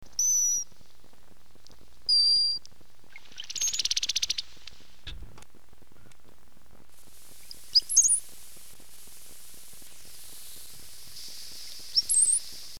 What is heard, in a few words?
Brown-headed Cowbird